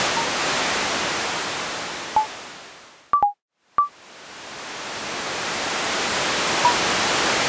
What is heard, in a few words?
ponds water